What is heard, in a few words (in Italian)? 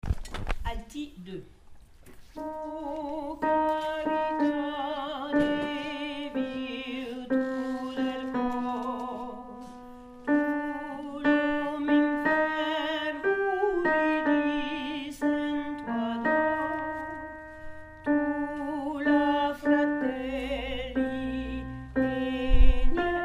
la carita alto; La Carita - G. Rossini